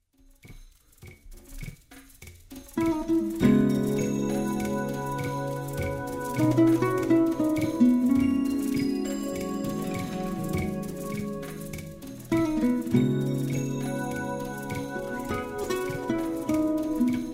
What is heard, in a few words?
windscape